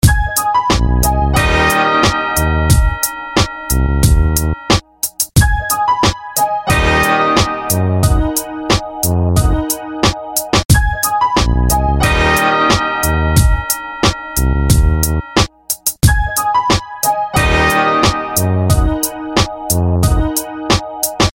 beat

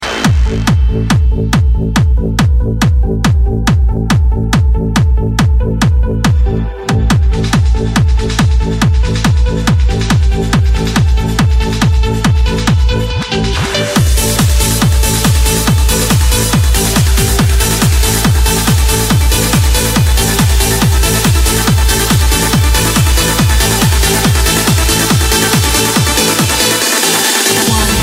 hard dance

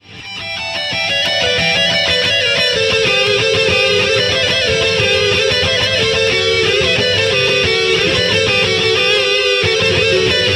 Solo

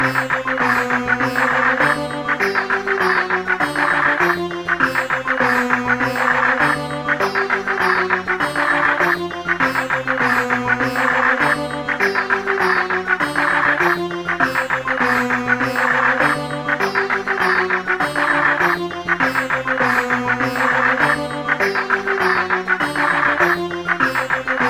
Sitar Knock Ringtone

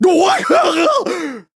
Shocked; Groose

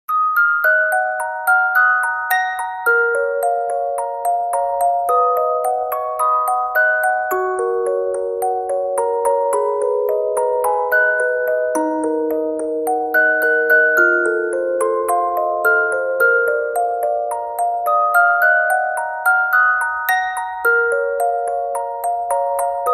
Orgel

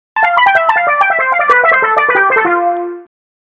Cheeky SMS

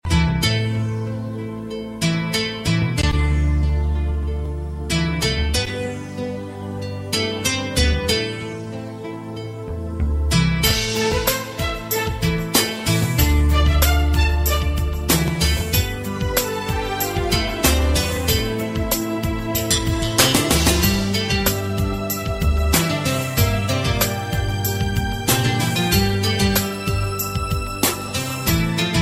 guitar cool theme

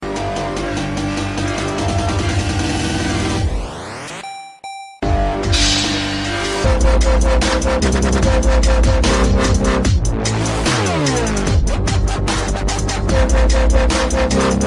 ringtone, games; zelda

